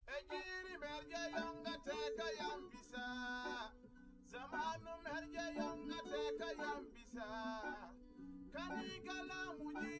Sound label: nodrums liverec 250ms 1m sim; SiSEC08; SiSEC underdetermined